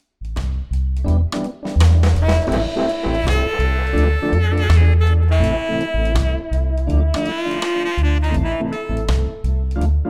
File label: tenor reggae